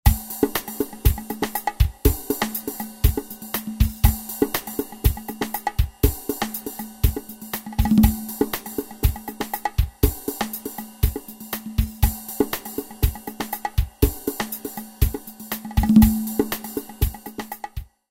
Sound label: Drums Ambient 4/4; mfx chain